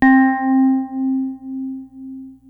PPG C3, Synthesizer; Hollywood Edge; Giorgio Moroder Rare Synthesizer Collection; PPG.2.3

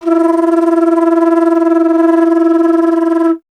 Alto Flute

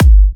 G, kicks; markus hakala kicks

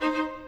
Violas, Orchestral

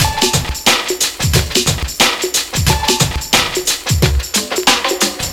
sonar so far, 90bpm

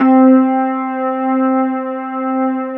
FENDER C3 R, Keyboards Old School; USB Soundscan vol.09; AKAI

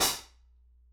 Soft Xtra Dark, Hats; Airwindows

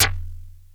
FM SYN PERC; Crypton - DrumBase5000

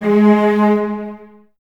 cellos G#3, String Ensembles, Detache; Miroslav Vitous

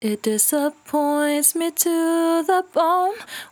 68bpm D, Velvet Vocal; Zero-G

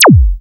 zaps; Techno Trance Essentials

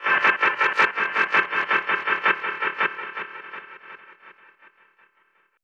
Yah Yah, Noise Percussion; Sonic Foundry (Sony Creative Software); Ma Ja Le Ethereal Textures

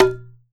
MIXED DRUMS

